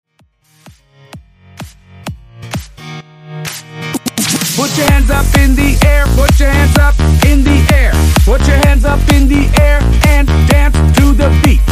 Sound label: Bounce Hype; 4th of July; Bounce Hype Edit